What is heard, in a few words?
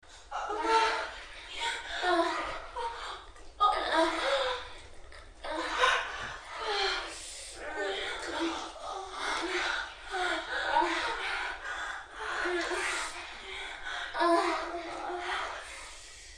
sex, moans; The Witcher Hunt v.1011